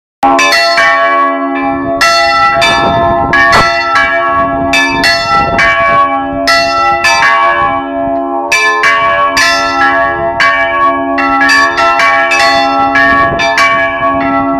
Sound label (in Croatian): zvona